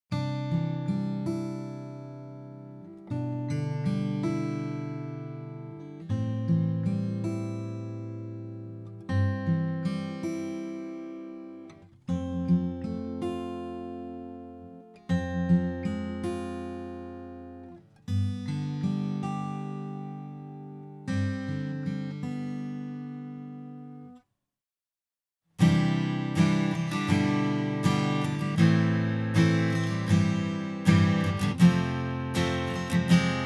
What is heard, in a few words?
guitar; アコギA